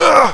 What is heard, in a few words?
player pain; firearms